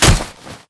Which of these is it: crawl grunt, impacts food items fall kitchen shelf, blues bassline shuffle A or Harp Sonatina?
crawl grunt